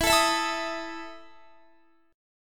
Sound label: E Major 7th Suspended 4th E down Guitar